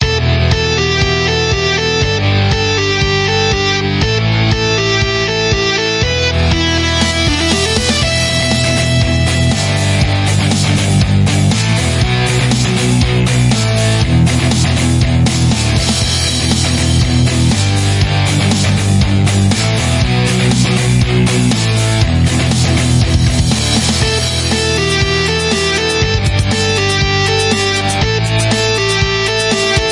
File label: energetic metal rock